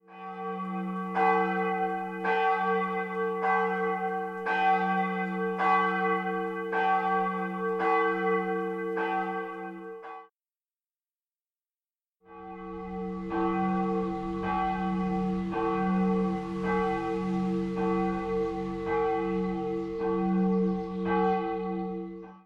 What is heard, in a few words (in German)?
reichenau mittelzell g glocke vorher nachher